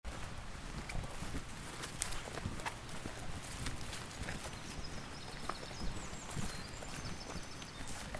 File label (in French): ambiance ruisseau oiseaux; college vallee du thore